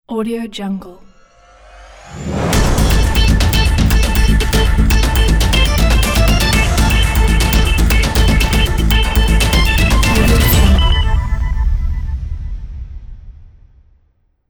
futuristic hitech introduction logo

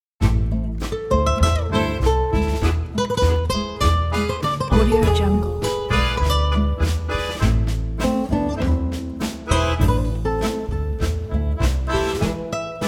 tango

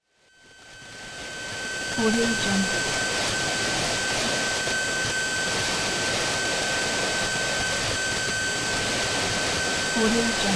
noise ring drone

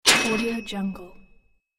old fashioned cash register

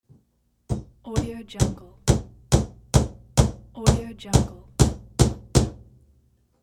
hammering nail

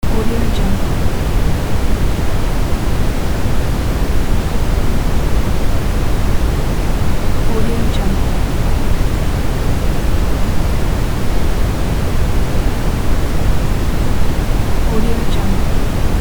colors of noise pack

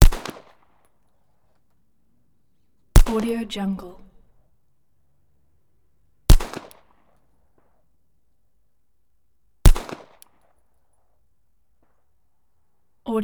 beretta pistol single shots many versions

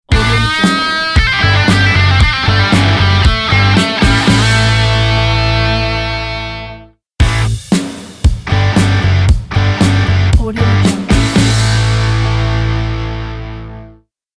rock logo

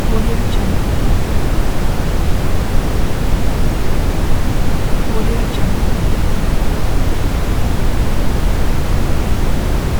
brown noise

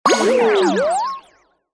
cartoon ui signal

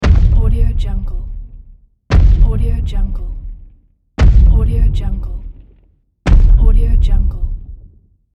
giant footsteps

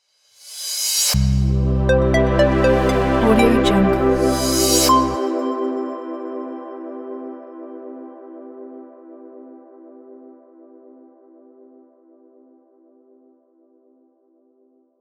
tech corporate intro logo

